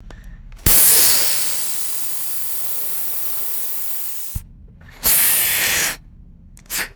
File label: pneumatic air releases and hissing